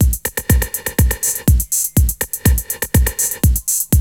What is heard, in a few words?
BREAK